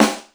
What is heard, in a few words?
RIM SNARE, DRUMS; East West - Global Sounds